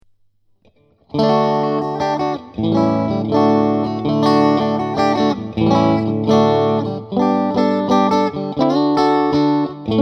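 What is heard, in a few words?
twangbar middle bridge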